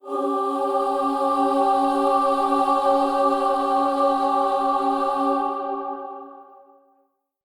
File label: Kuva Lich Requiem Mod Choir F